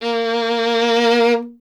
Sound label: STR VLN, Vln Detache; Miroslav Vitous